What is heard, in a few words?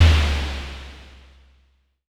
Drum X FX, Pearl